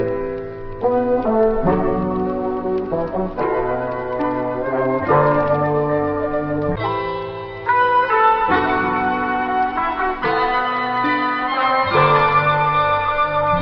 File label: horns, jazz loops